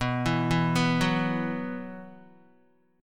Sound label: B Suspended 4th, Guitar; Bsus4 arpeggiated; JGuitar